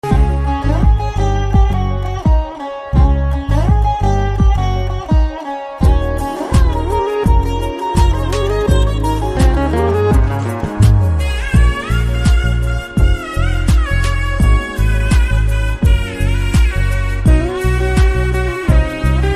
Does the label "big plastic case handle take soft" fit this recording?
No